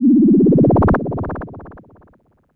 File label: Virus short bubble